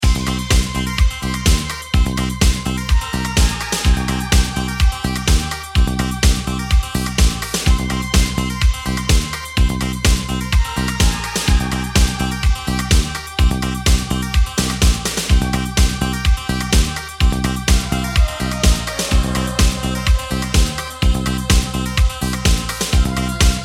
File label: Instrumental